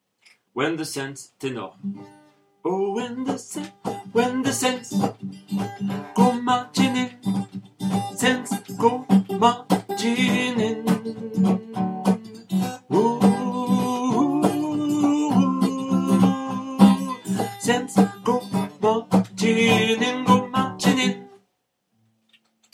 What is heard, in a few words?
when de saints tenor